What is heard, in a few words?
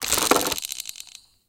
roulette start